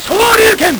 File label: LSDj, Nintendo Gameboy; 300 Drum Machines